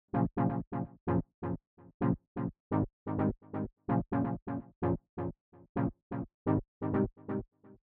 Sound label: parallel motion whole step; 什么是平行和弦？